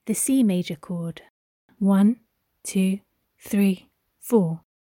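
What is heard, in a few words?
organ, C major chord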